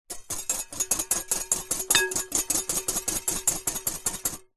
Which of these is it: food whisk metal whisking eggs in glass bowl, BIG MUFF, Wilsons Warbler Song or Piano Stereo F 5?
food whisk metal whisking eggs in glass bowl